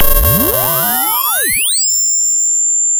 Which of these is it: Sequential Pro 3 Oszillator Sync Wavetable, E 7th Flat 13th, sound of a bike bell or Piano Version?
sound of a bike bell